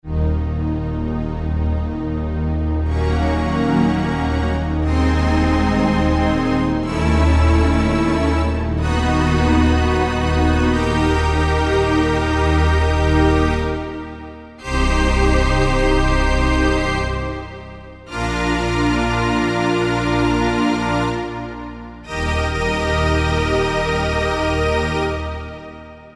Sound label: Grandioso, Korg N364; Factory Combi demos